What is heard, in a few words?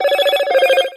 Motorola Edge 30 Ultra Notification